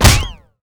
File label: Gun Shell, Damage